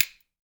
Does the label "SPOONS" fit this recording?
Yes